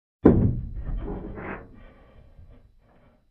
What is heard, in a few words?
Wood Bang Sound